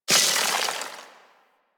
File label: Melee Heavy Air Slam Impact Ice; Weapons; Whooshes; Warframe